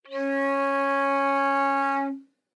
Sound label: Flute nonvib ff Db4